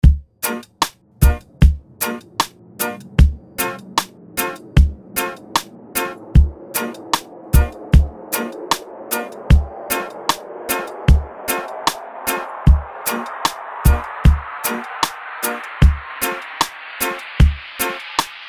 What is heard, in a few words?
Acapella Break Starter